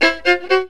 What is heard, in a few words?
INTRO FIDDLE